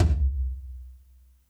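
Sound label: TOM soft; Zero-G; Chemical Beats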